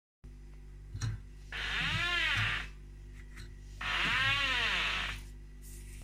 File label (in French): grincement lave linge indesit; modele btw srn